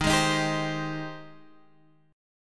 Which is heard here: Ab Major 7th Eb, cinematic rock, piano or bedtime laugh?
Ab Major 7th Eb